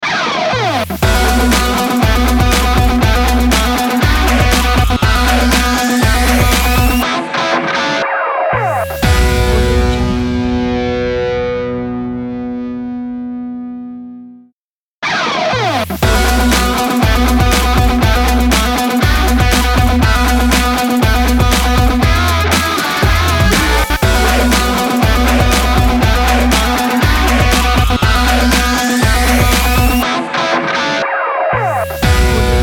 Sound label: energetic sports logo